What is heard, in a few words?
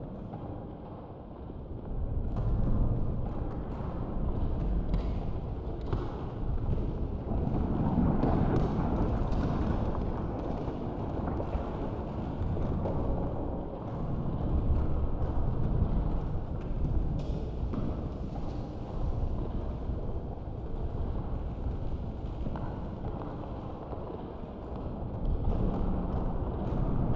atmos crumbling world; Hollow Knight: Silksong